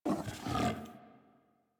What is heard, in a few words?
sleep idle snore fidget, Kubrow; Enemies; Warframe; Tame